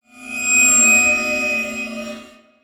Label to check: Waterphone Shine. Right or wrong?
Right